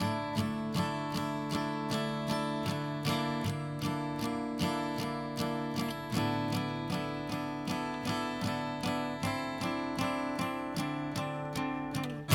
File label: End Build GUITAR; transistor